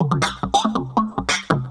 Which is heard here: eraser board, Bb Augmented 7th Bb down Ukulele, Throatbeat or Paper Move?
Throatbeat